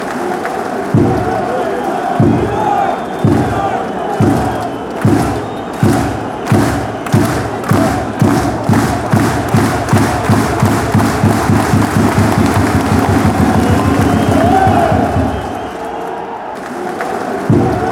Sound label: drums, fanchants; deutschland